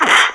bump; openffa; mercy

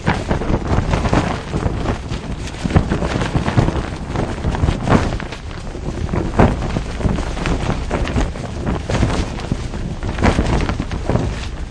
flag flapping

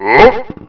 jump; vortex; voodoo

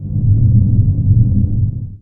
ominous; openffa; bldstorm

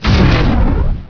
scarab; baseq2; gxsounds

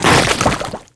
corpse explosion; spells

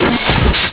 jump; openffa; backfire

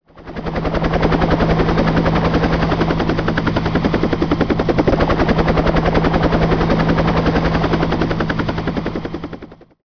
heli

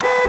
fall; qbot

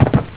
jump; vortex

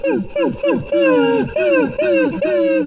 scratch, monsters, guard; zaero

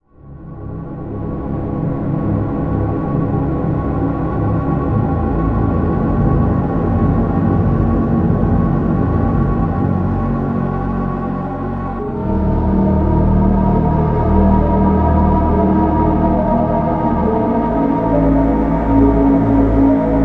hotel soundtrack

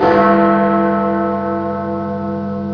big ben

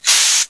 air hiss

